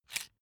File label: electric drill template slide out slow; maschinen; bohrer; soundpool